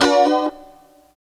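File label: C#4 B mono slide R, Guitars; Spectrasonics; Hans Zimmer Guitars VOL-1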